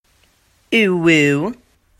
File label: uwuuuw